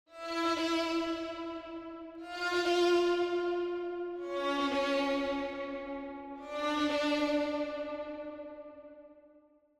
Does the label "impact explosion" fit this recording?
No